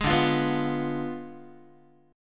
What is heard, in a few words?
D Diminished 7th D down Guitar; strummed